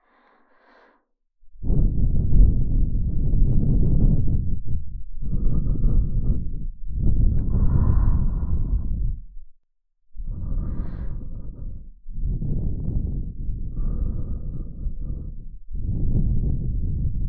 耳ふ～; バツイチお姉さん; 耳元に感じる鼻息吐息

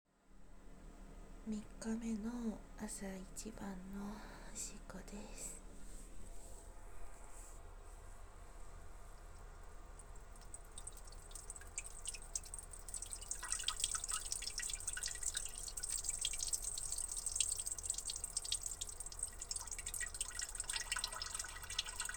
トイレ で オシッコ; ３日目・本日最初の朝のトイレでオシッコ！; 人妻OL1週間おしっこ!; 実演音声

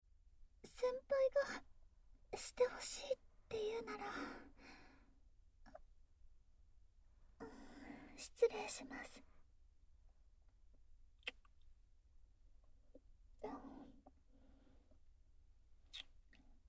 清楚謝罪イラマチオループトラック, ASMR; ルカちゃん

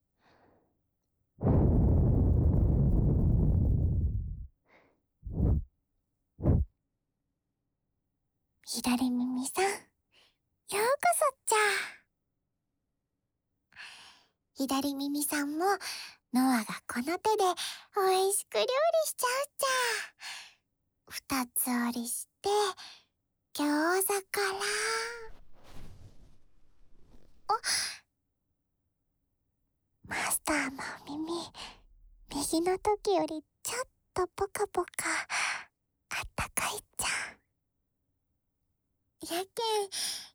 おいしいお耳マッサージと、金の耳かきでの徹底お耳ケア・左耳; 蓄音レヱル ノワール

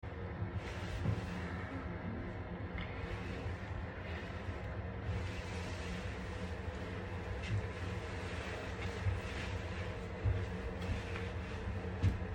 おしっこ, 放尿; 大量汁/液

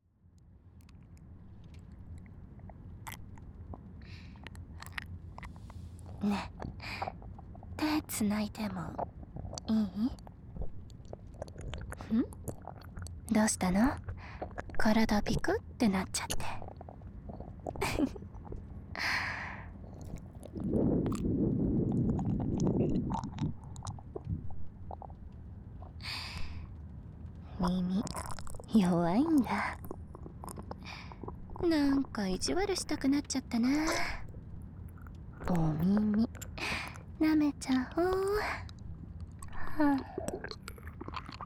耳舐めされながら キス・フェラ・乳首責め・パイずり・アナル舐め手コキ・騎乗位される音声; 欲求不満な人妻

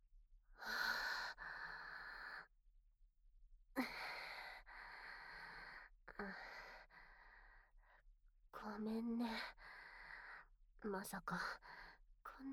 野外耳舐め; 公園裏で野外耳舐め→ 口マンコ飲精

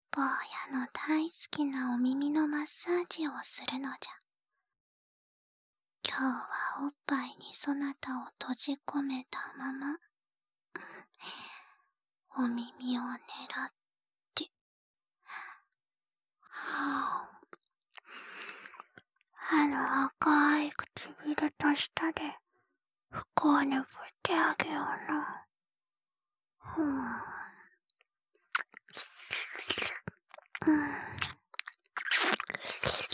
お耳舐め; おっぱい抱っこ、お耳舐め：心とお耳のまっさーじじゃ; 高貴なツノガミ様のほかほかおマンコと繋がったまま、幸せにとろけ合う共寝

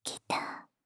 ギター; 無声音ASMR; シャッフル睡眠法; バイノーラル音声; シャッフル睡眠ランダム再生用

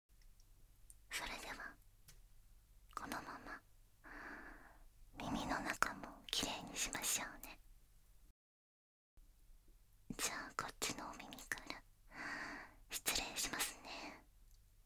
左右反転 耳かき・梵天・ささやき・耳ふー; 奥手なセラピストさんはあなたの疲れた心と体を癒したい ～おっとりお姉さんの特別サービス～

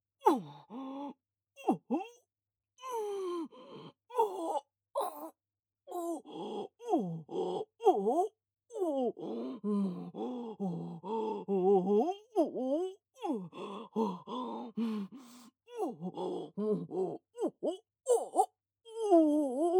正面 近め オホ喘ぎ; ネモナ

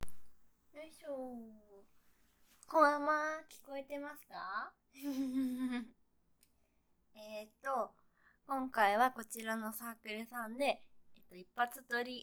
ノイズ除去, 新人Vtuberがアヘアヘ声でイキ狂った後もイクイクしながら即絶頂オナニー実演

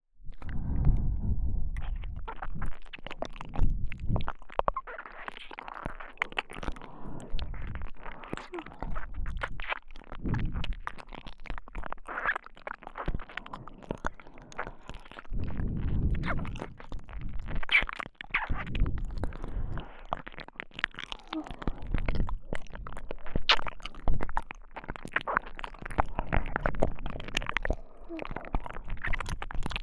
両耳舐めループ; 両耳舐め/両乳首舐め虐め特化

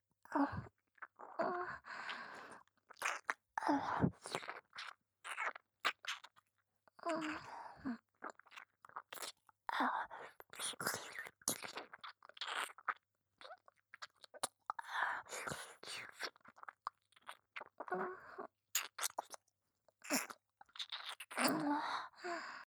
耳舐め（軽め）; 【甘々媚びアクメ】性欲旺盛な陸上部の先輩後輩JK、ベロチュー青春溺愛えっちでコーチの汚ち◯ぽ生ハメ特訓♪

